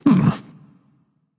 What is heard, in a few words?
thinking, voice; Smite; ymir skin14